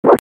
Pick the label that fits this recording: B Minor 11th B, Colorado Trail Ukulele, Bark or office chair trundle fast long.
Bark